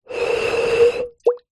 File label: bottle beer blow air top liquid inside